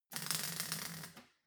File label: weld sizzle